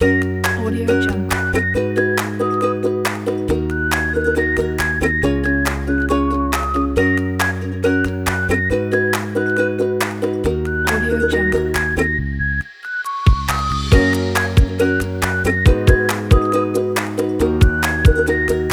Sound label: ukulele corporate